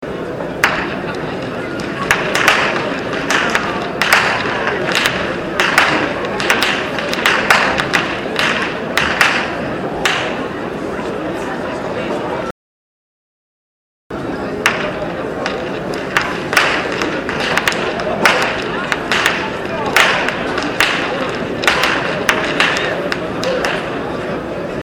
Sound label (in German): schnalzen, jugend; anthering